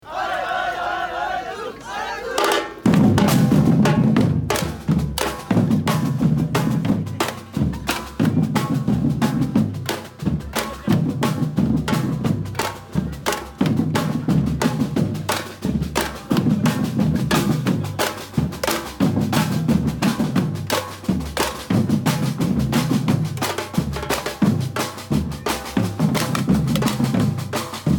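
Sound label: drums carnival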